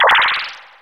dice break; w00tguy; botparty

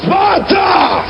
beam start, debris; svencoop; cracklife

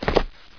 player jump land; mistake coop

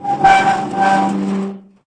push box, debris; svencoop; echoes

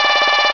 tripwire; svencoop; echoes

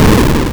tron die, arcade; w00tguy

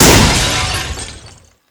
breaking; ragemap2020

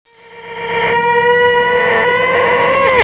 roar; svencoop; cracklife; tentacle

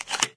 spas12 insert shell; mistake coop

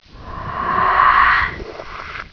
shoot, scrag; monsters; svencoop; quake1

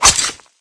cbar hit bod, weapons; mistake coop

